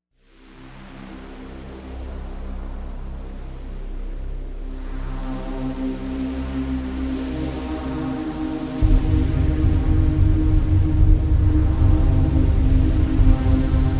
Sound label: Cyber Suspense